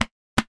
stomach ponpon

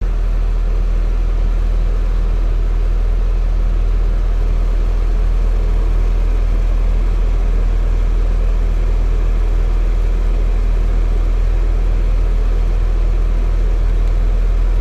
air conditioning

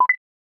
decision, button

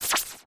setup, battle

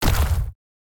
blow, battle

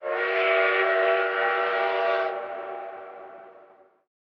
locomotive whistle

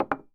cup put

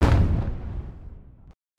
fireworks

